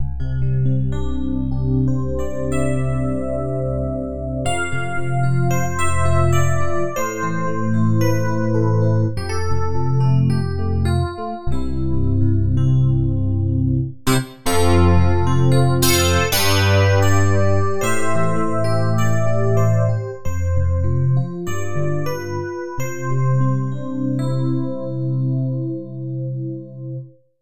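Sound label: FM E-Piano, synth; KORG OASYS; oasys assault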